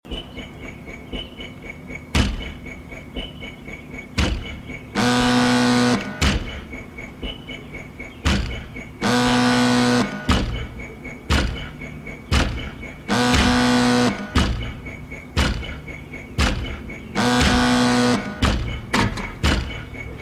Techno

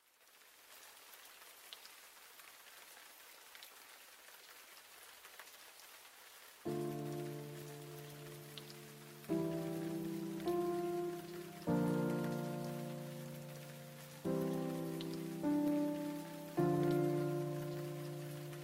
Piano Suite

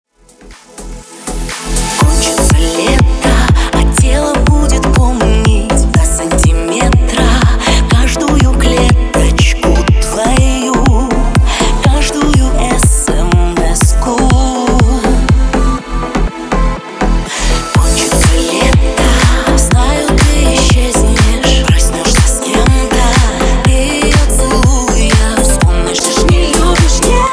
Radio Remix

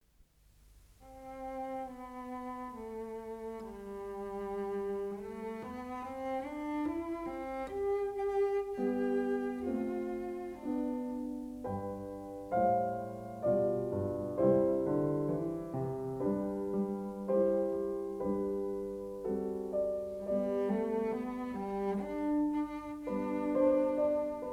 Cello Sonata No 4 in C Major Andante

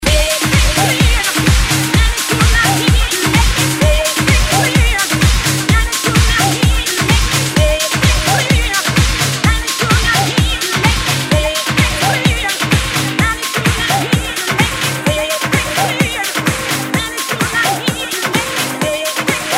Ocean Drums Main Mix

